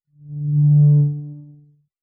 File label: synth